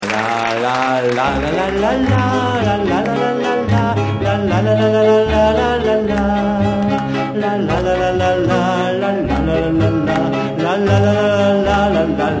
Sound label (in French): la petite araignee instrumental